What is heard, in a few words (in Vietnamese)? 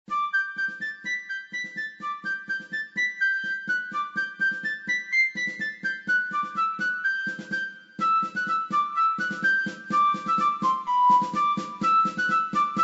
khong loi xiaomi redmi 10x